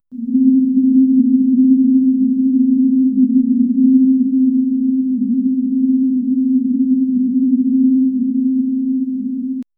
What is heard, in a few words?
sci fi, hover